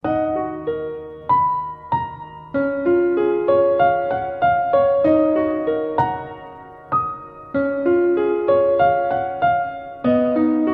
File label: Beautiful Piano